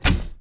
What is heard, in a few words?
Bow Release; Civ4 warlords